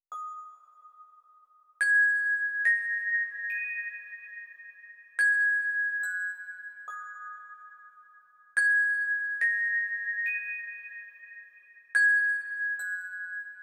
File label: glockenspiel, 142 BPM D# Min; Cymatics; Can't Die